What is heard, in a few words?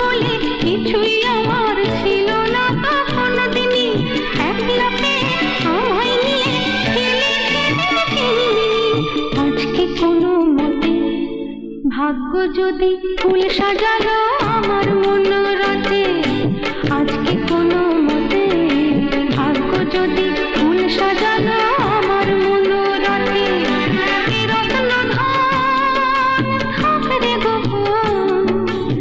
bengali ringtone